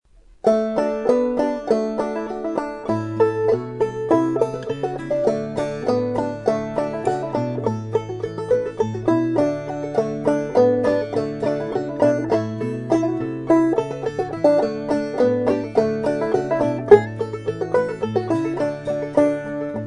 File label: guitar